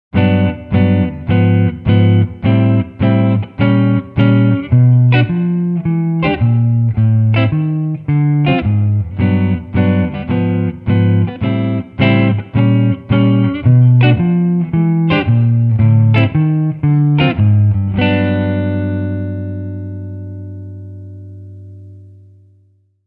LP Standard

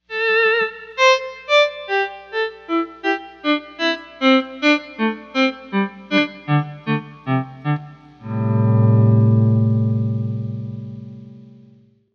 Stereo Volume

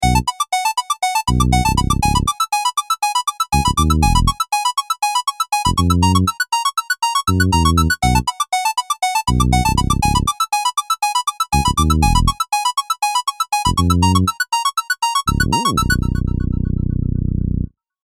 Minimoog Arp and Taurus bass

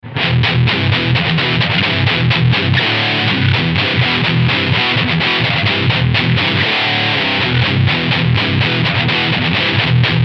Heavy

